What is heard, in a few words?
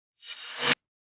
Reverse Glass Break; SoundsCrate